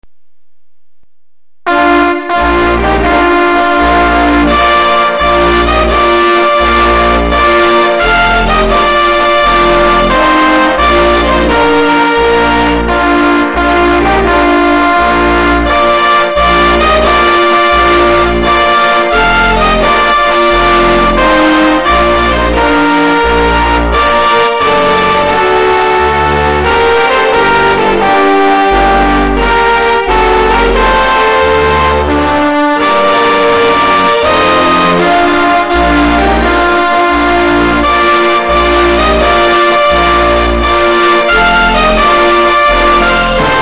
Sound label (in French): hymne italie